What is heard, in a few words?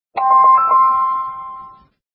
tmobile